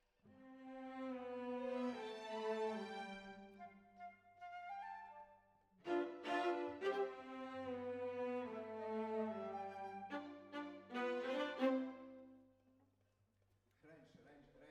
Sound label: Violas, jazz for piano